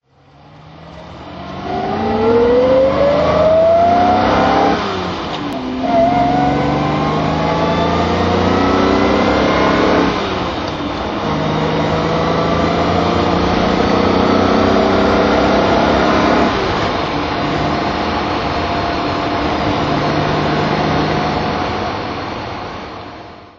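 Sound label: jaguar e type v12 fhc driving, Engine sound driving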